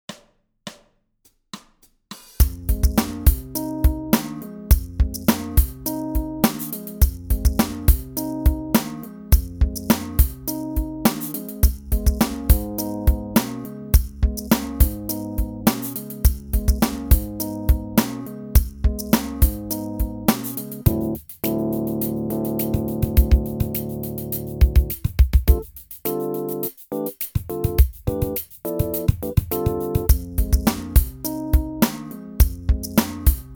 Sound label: minus Bass